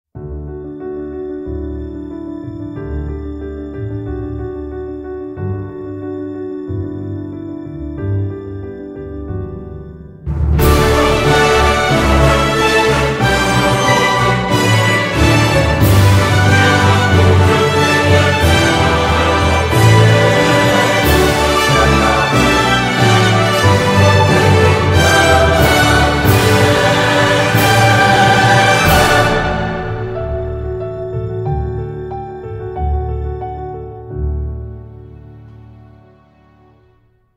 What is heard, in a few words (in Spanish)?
Epic Fanfarria Instrumental